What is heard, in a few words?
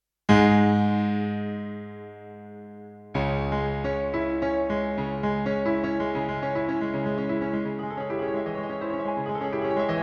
Proteus 2k Stereo Grand, piano